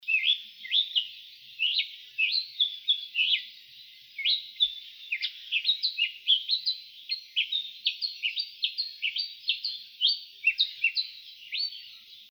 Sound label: Cardinal Honey Eater Dawn song